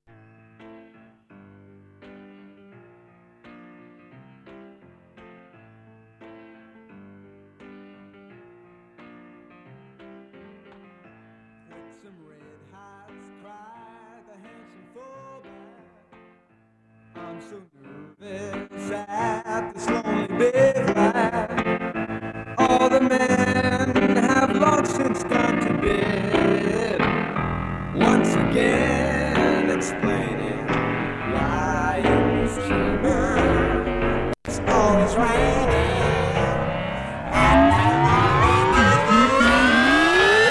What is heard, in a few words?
song sweep